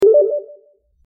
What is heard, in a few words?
message notification